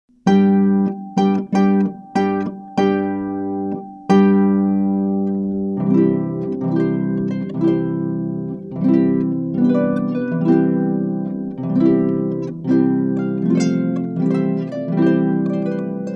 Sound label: Wedding March